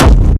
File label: Techno Kick; 30 Techno Kicks 2025; Ghosthack